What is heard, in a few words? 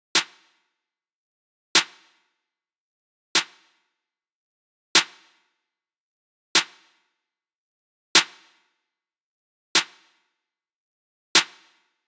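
Drill Drums Snare Solo Reverb After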